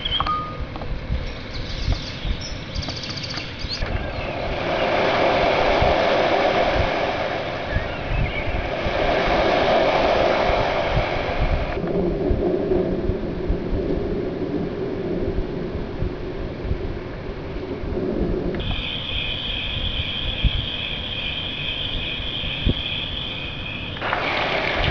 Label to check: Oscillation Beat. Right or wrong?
Wrong